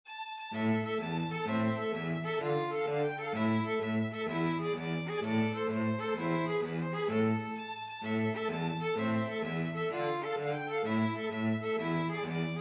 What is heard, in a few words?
tarantella 2 violins cello